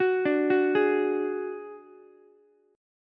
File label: F sharp Suspended 2nd Sharp 5th, Ukulele; arpeggiated; JGuitar